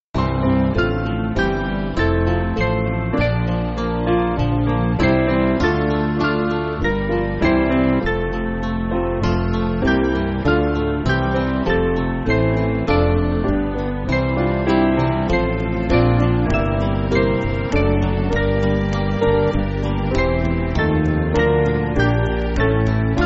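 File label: Band, Backing Files for Churches; O Gladsome Light; Brindley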